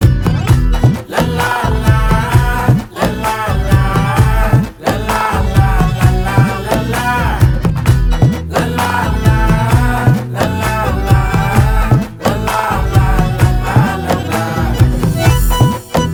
Song Bgm Ringtone

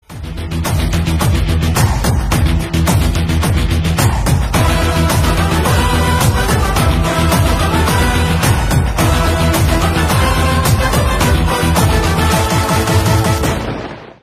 BGM Theme Ringtone; Ustad Bhagat Singh Movie; Telugu Ringtones

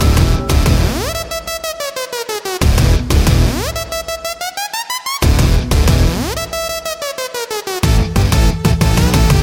BG Tone; from Bogan Movie

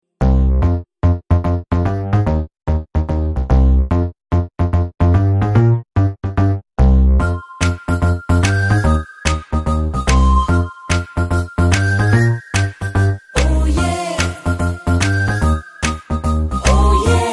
Shehanai BG Tone; from Officer Movie

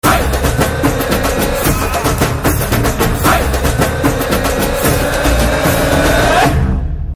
Drum Beats Ringtone; BGM; Telugu Ringtones; 7 Sec; from Krack Movie

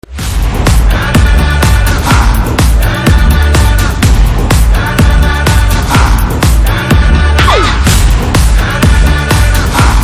BGM DJ Ringtone; Hindi

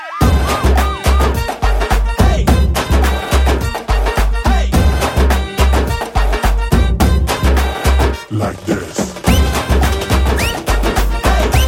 BG Tone; Radha Movie

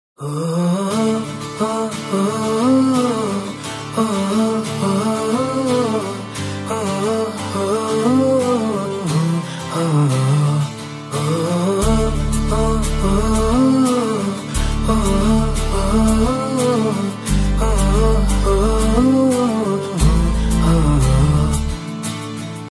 Humming Ringtone; BG; from Padi Padi Leche Manasu Movie

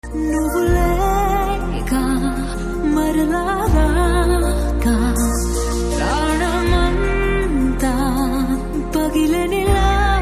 Telugu Trailer Song Ringtone; 99 Songs Movie; Telugu Ringtones

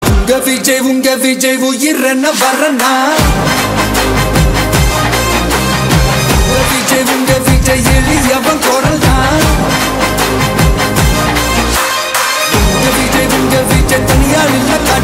Ringtone

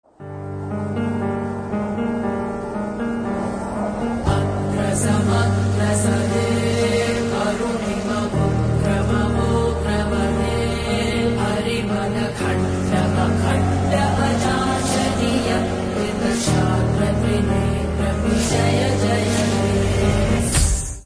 Glimpse Ringtone; Yellamma Movie; Telugu Ringtones

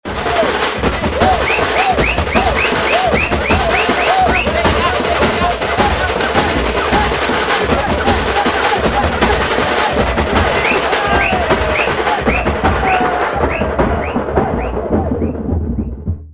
Teenmaar Beats Ringtone; Dear Comrade Movie; Telugu Ringtones

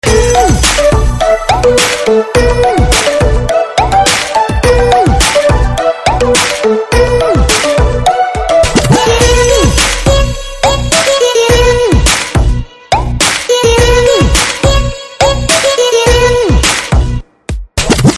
Glassy BGM Ringtone

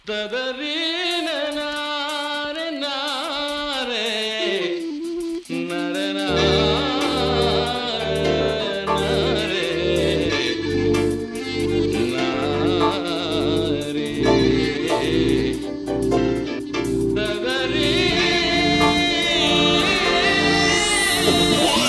Humming Tone; BG; from Pelli Choopulu Movie

